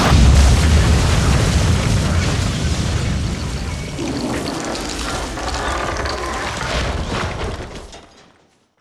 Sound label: explo bomb; Explosions; PremiumBeat